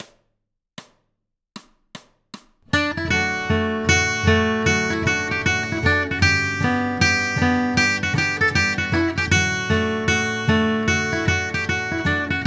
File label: Bluegrass Guitar, notey fiddle tune C